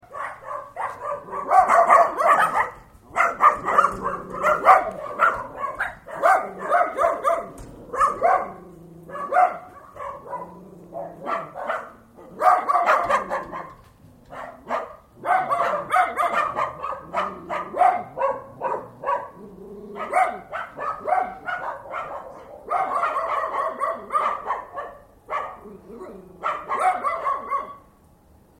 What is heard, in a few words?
barking dogs